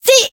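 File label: kappa deploy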